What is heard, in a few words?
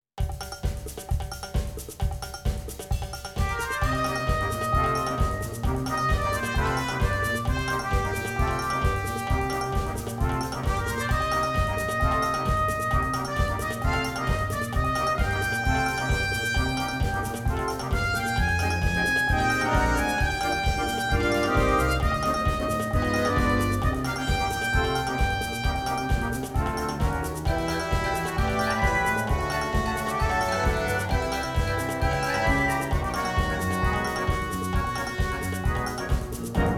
Latin Suite Samba End